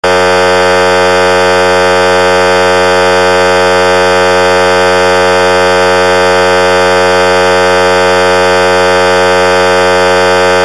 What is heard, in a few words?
Siren Tone AIR HORN; UNITROL; Pathfinder